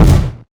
footstep; Garry's Mod; indigo park; nightmare catnap

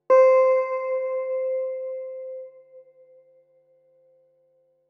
step; Garry's Mod; poppf electrodactyl

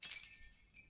potion; pou

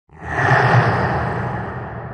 monster scream on; zoochosis; deep sea; lazynap

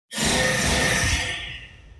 jumpscare; Hagy; copycats; PandaClones Jumpscares

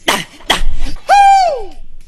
idle, hehe; skibidi toilet epsiode69

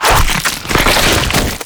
eye rip pull; haggy wuggy bot; gnarr

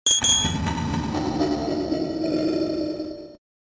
laugh; Garry's Mod; vinagre npc pack 1

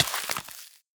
freeze hurt; cauldron frozen lava pack

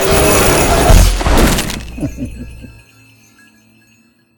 Jumpscare; boxy boo v2; BoxyBoo v2 SLVM; Giftboxy

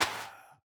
break, soulsand; The Monster Plane

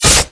attack long; The Monster Plane; kolmods melee

